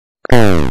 event failure

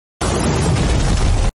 landing; homelander

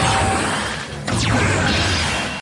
transform sound; donbrother; hitotsuki

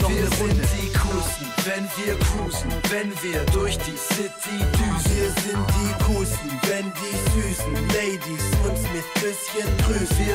massive tone

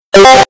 ping loud; discord

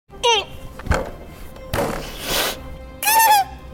uh gehehe

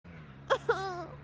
ahhum

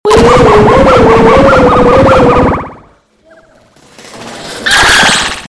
wee woo wee woo wee woo

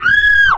cri feminins scream

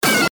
pipe pitch

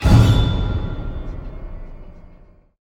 horror stinger

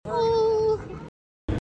ooouhhh

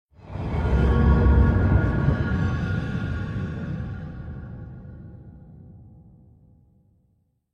minecraft cave sound

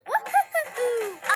whoo ho hoo hoo

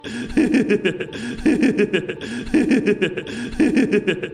mental breakdown laugh

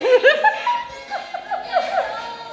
ahahah

